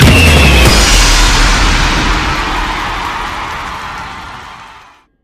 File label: big win start; netent